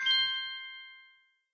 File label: count Up End; lost relics